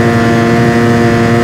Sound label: rpm, sled; WhiteOut